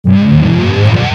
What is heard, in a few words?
stun count; cso